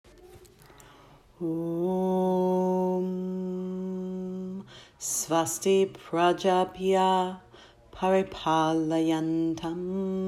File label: Ashtanga Closing